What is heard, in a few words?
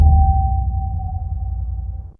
Timp A2, Fairlight CMI IIe Effects; Effects3